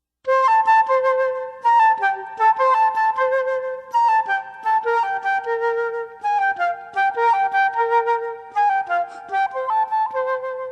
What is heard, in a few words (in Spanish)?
sonido flauta